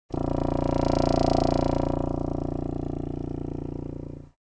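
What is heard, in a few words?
double bassoon low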